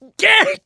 scream while pounced; voice

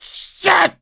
choke; survivor

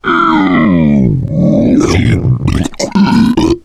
male boomer lurk; voice, idle

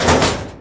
door metal thin close, doors

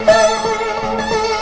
dobro danger

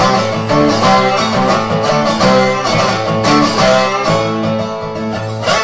dobro

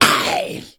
hunter stunned; pain

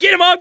scream while pounced, mechanic, survivor; voice

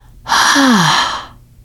pain relief sigh, teengirl; player; survivor; voice

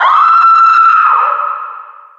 female distant scream; witch; npc; attack

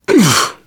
shoved

